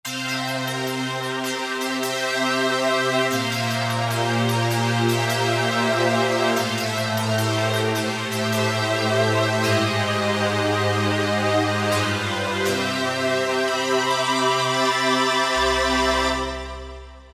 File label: Zip Pad; Generalmusic Equinox